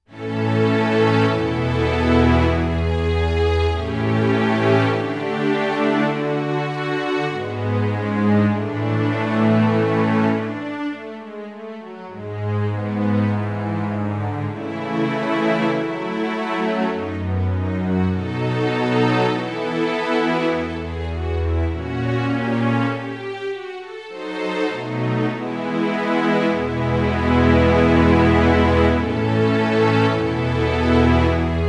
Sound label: strings